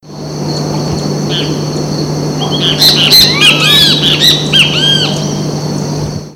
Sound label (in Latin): Pitangus sulphuratus; Guarau; Jureia; Silveira